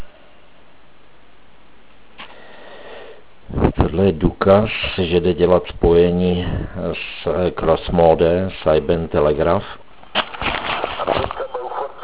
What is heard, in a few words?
80M CROSSMODE; Cross mode spojení 80m; HB1B